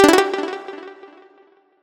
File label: combo; quatuor